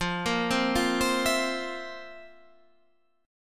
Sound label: F Major 7th Suspended 4th F, Guitar Standard; FM7sus4 arpeggiated; JGuitar